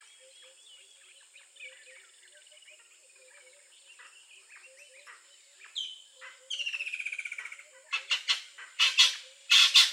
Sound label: natal spurfowl african bird; soundscapes